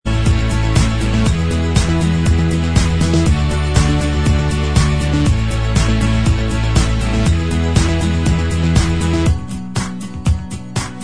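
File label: instrumental, backing track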